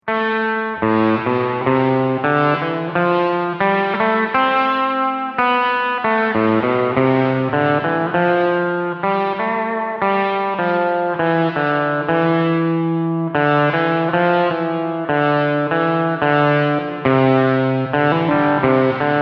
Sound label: reverb test